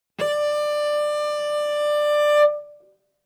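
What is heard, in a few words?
Cello arco fortissimo sul A D4; MIS Pitches - 2014; Strings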